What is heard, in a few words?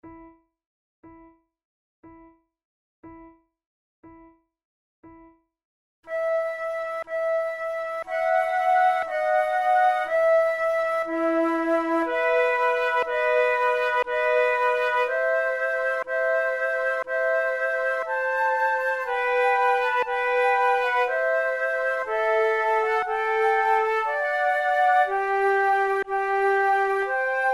sonate en trio, lentement, flute